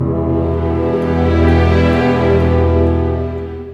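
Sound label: 60 dark, orchestra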